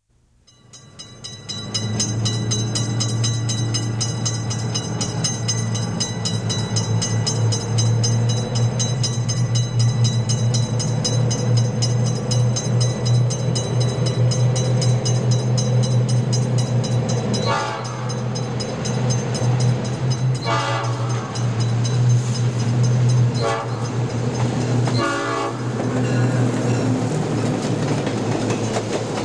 PASSAGGIO A LIVELLO

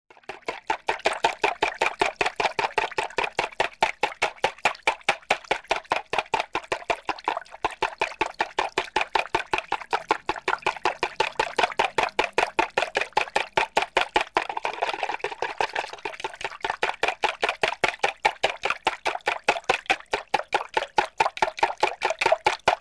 UOVA SBATTERE A MANO PASTA

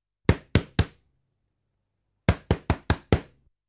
BUSSARE ALLA PORTA

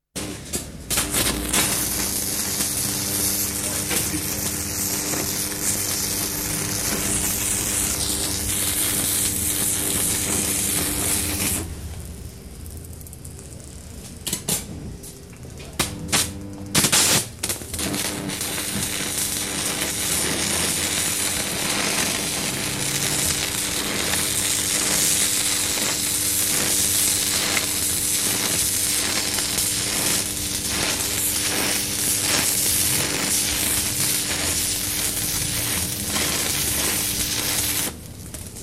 SALDATRICE

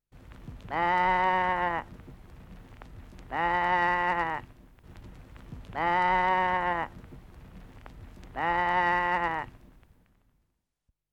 BELATO PECORA

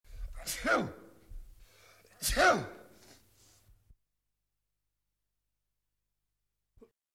STERNUTO

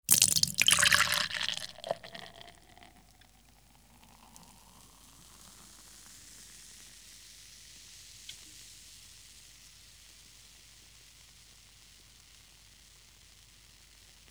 ACQUA MINERALE BICCHIERE

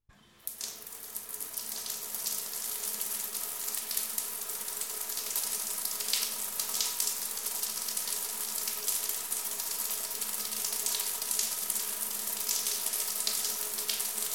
ACQUA DOCCIA

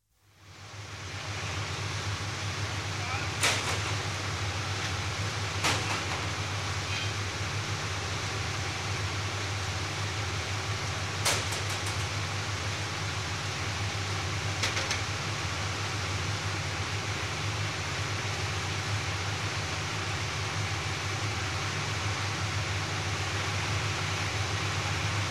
OFFICINA TIPOGRAFIA

